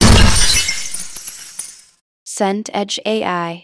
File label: smash glass